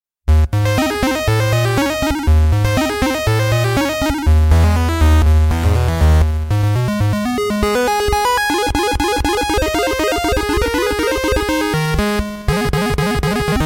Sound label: Maple Leaf Rag 8 bit